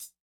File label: chillhouse hihat; Electro